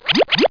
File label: Cartoon Open And Close Eye